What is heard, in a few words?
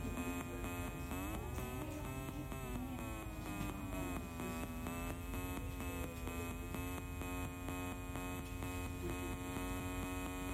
modular synth; test recording